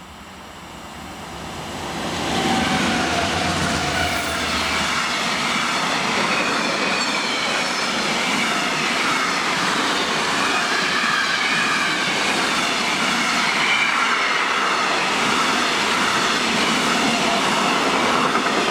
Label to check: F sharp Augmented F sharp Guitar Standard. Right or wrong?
Wrong